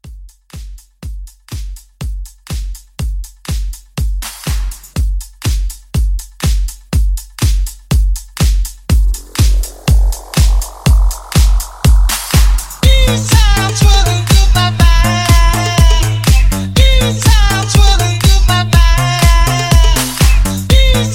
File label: House Blend, 122